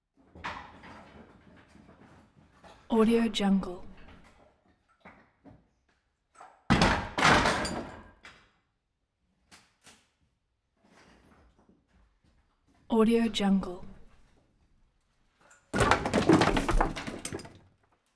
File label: wood heavy debris fall